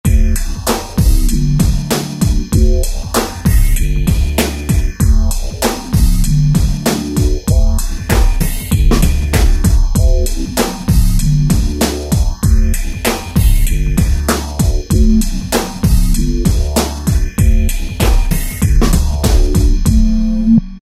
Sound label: Swingy Breakz, Factory Patterns; Korg ES-1